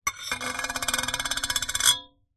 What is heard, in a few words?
foley metal serrated metal edge scrape on metal pan